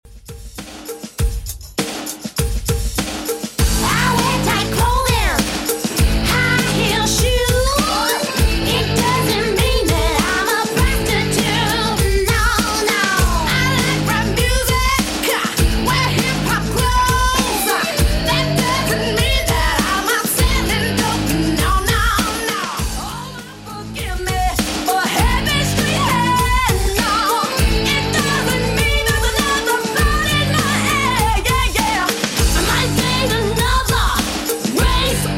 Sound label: 90s Redrum 100bpm